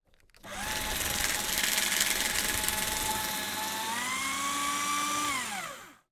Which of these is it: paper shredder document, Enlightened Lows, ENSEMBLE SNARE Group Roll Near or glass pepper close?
paper shredder document